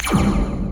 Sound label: spring